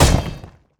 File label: Idle Ground